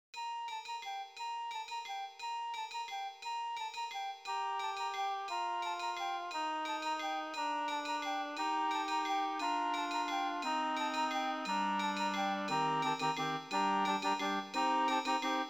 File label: carol of bells